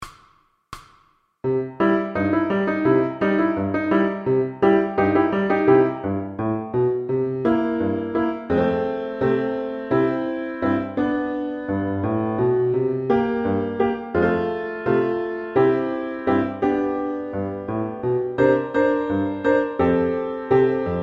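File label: VLN Summer Dance, piano accompaniments for violin; MTB Grade 1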